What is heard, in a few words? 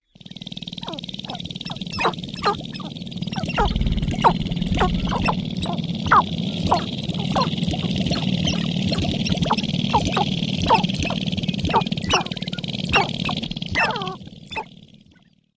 lek calls